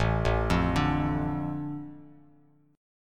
A Suspended 4th Sharp 5th, Bass Standard, Asus4#5 chord; Asus4#5 arpeggiated; JGuitar